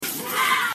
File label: aaaaaau